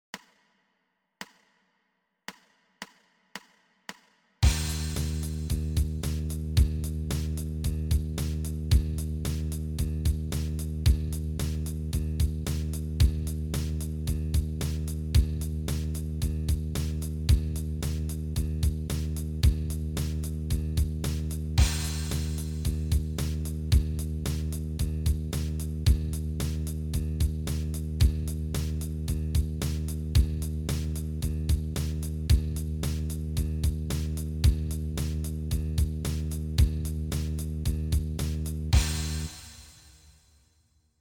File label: bass startup eb